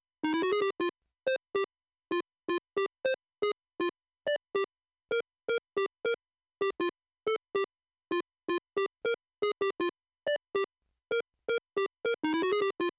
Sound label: Melody; chippy